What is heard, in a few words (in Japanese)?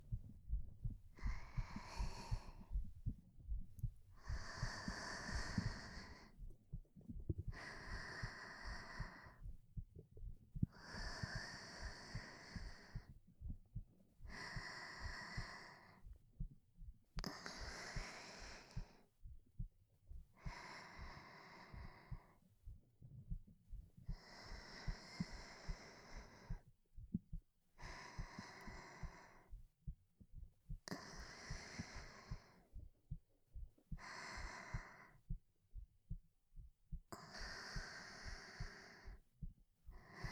寝息+心音ループ